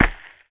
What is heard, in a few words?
debris; PC Gamer IT CD 41; Daikatana